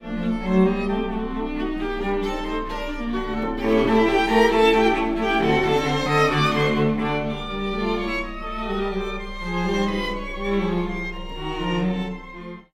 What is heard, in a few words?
quintet